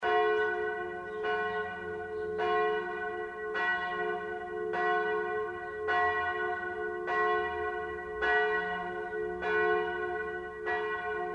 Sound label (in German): Glocke Augustinusglocke, Stift Wilten